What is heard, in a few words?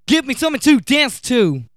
VOX SUNG; AMG Megabass REMIX! VOL-2